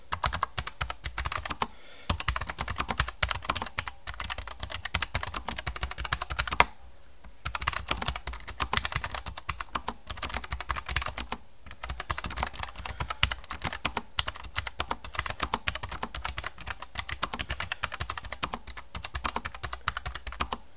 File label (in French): enregistrement son msi vigor gk50 low profile tkl